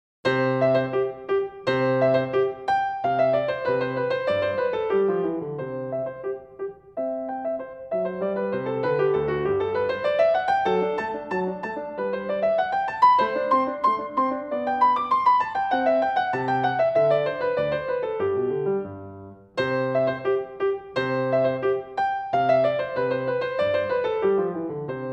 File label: Keyboard Sonatina in C Major, Op. 36 No. 1